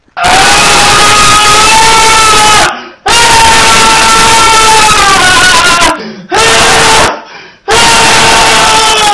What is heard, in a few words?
haaaaaaaaaaaaaaaaaaaaaaa